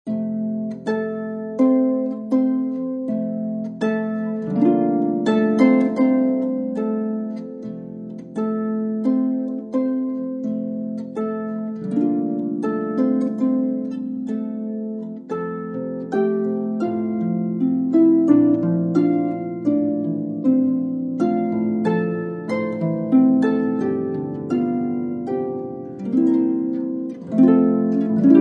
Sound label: Tis So Sweet To Trust In Jesus, Harp Hymns